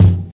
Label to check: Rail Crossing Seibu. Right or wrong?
Wrong